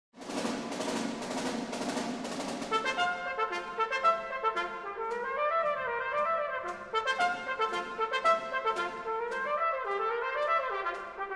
Trumpet; The Berliner Philharmoniker perform Stravinsky s Petrushka; Trumpet tutorial

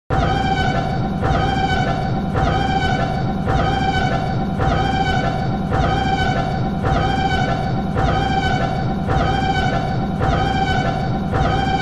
Siren Alarm; 5 min; Republic Cruiser

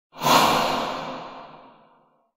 ghost light attack; phasmophobia sound board effects

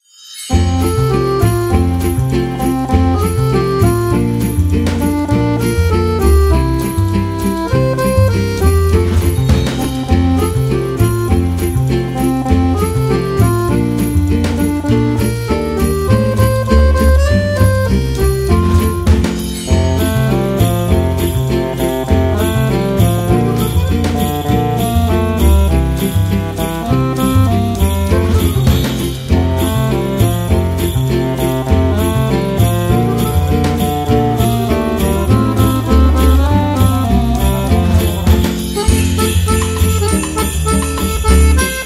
Jingle Bells Instrumental Jazz

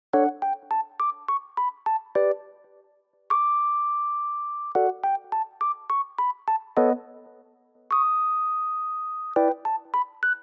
Royalty Free Music Cute Happy

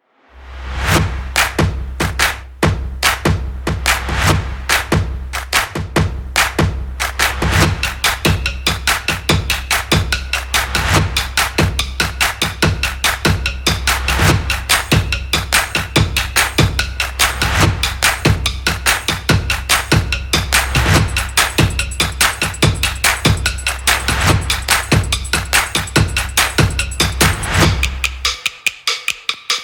Upbeat Drums Percussion Background Music; For Kinetic Typography Video; MUSIC4VIDEO

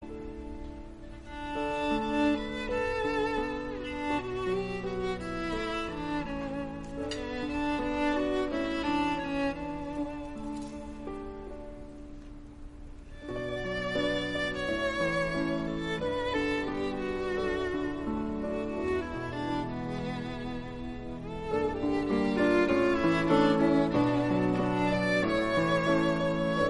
Violin Violin Music

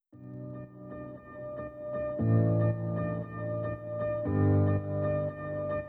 instrumental

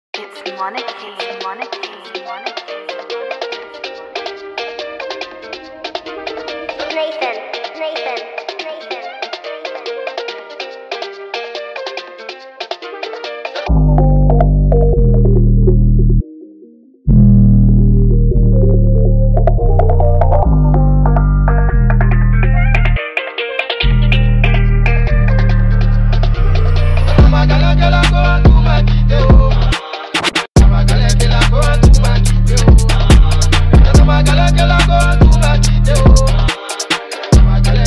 Remix Drill

